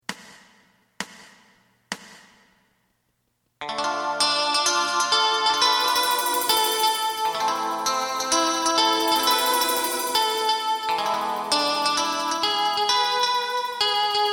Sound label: bass